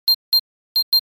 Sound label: Sci-fi laboratory door security keypad beeps